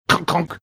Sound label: making time card puncher sound